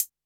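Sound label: Closed Hat, Electro Kit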